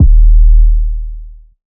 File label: Bass; 7rystan's 700+ FREE COLOSSAL EDM SAMPLE PACK